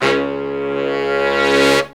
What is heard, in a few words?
R&R Horns; Roland L-CDX-03